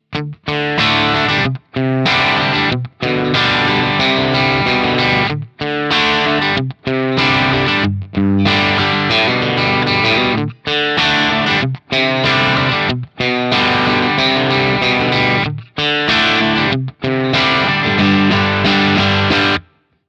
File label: Sweet Home Crunchy; Nobels ODR-Mini